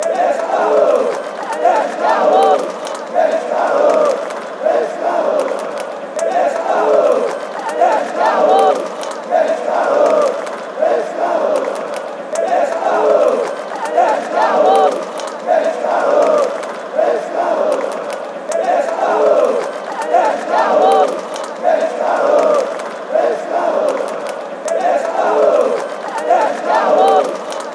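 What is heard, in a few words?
fanchants, football chants; edcarlos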